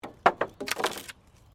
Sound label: Wood planks debris; Materials